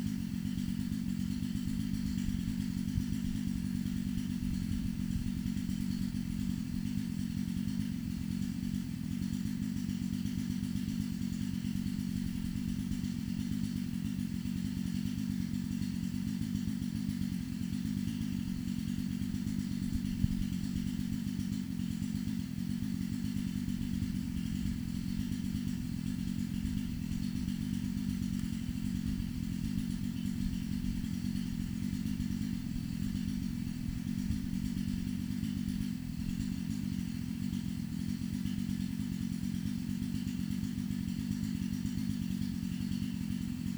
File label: Chainsaw quiet